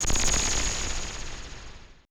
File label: AMBI FX